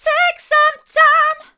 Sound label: take some time hard, vocal